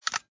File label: click; chicken